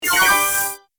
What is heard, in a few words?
Power up retro achievement mobile game; level up